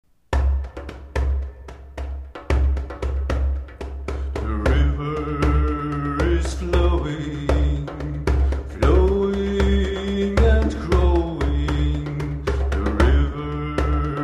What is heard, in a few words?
The River Is Flowing Djembe Gesang